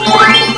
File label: Bonus Crystal Got; Crystal Crazy Demo